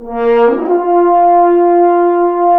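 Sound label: french horns, swells; Roland L-CD702; F.Horns FX+; FHns Swoops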